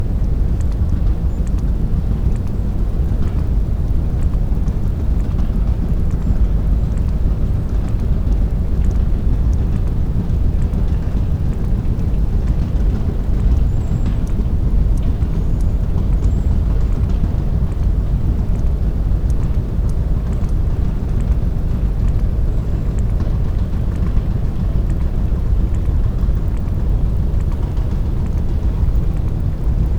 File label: Many Clicks